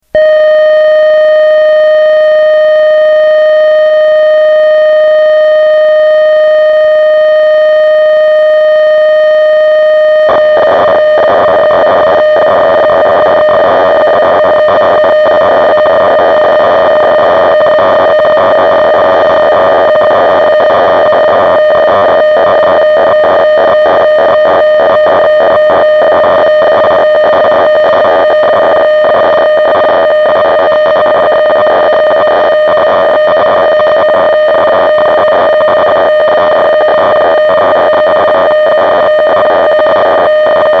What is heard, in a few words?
siel dk700 factory